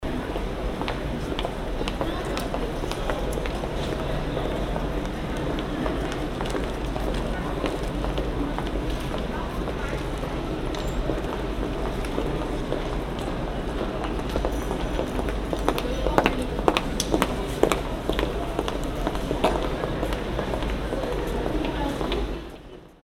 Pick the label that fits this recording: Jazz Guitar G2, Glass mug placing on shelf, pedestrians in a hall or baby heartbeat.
pedestrians in a hall